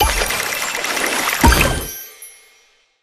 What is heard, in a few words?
fish swim wrapped sfx; Candy Crush Friends